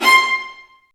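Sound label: Marcato, Strings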